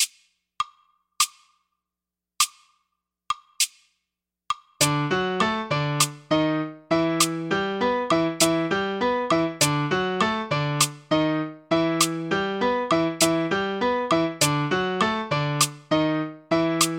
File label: tumbao slow